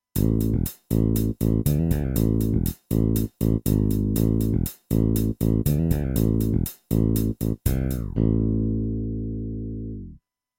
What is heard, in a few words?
gliss, bass